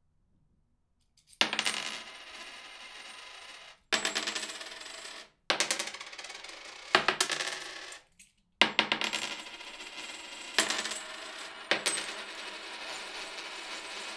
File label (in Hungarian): asztalra apró dobálása, pénz; angyalfold